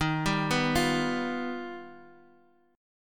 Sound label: G Augmented 7th